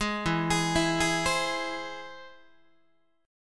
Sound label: E Augmented, Guitar; E+ arpeggiated; JGuitar